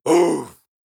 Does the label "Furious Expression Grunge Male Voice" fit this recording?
Yes